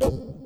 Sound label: perc, 100bpm